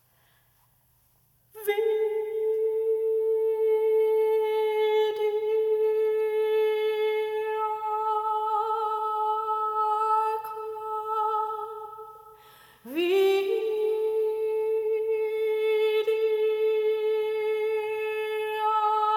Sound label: Old Roman Chant; Vidi Aquam